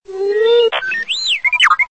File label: Charged; R2d2